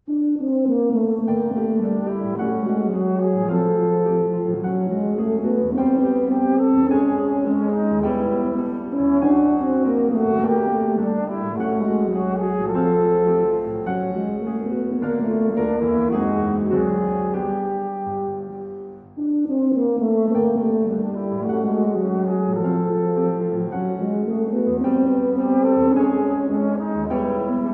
Divertimento for Horn, Tuba and Piano - Moderato

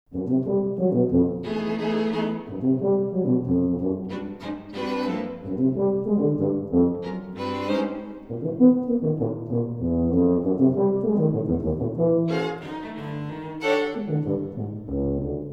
Quintet for Tuba and Strings Andante

